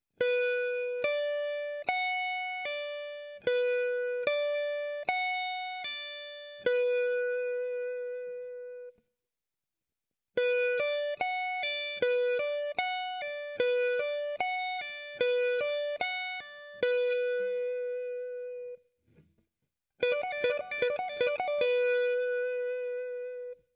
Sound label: Sweep Lick B Minor 2-String